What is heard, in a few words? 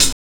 CHH, DRUMSAMPLS, Disco Fever; Best Service ProSamples vol.48 - Disco Fever; AKAI